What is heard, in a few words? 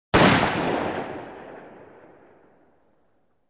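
357 Magnum Pistol Shot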